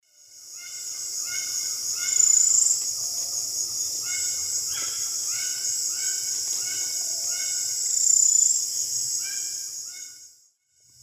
Platyrinchus saturatus, song; S. America; Eastern Lowlands

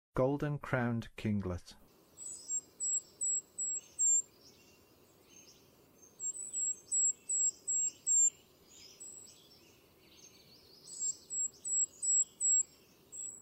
Golden-Crowned Kinglet; North America

